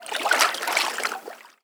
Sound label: lake, ambient; Fate